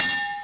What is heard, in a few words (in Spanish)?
Sonido de Golpe; mobile; el chavo la fiesta de don ram n